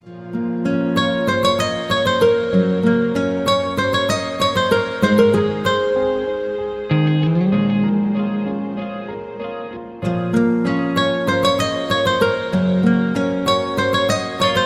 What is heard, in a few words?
guitar, ringtone